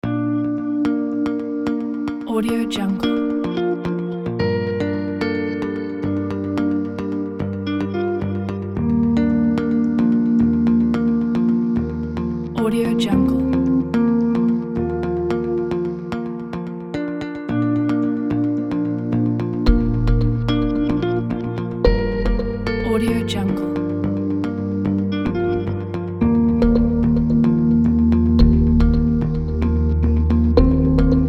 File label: ambient guitar slideshow